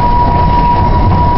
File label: Flange, Train; OpenBVE